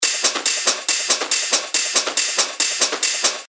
140 BPM, techno loops; McMahon; Revealed Techno Top Loops Vol. 9

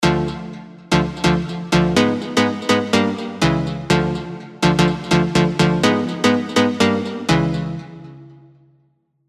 serum 2 house stabs; alonso saloon serum 2 house stabs; Ice Skate Uphill

